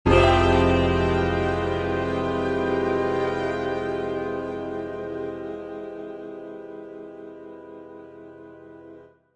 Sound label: spy chord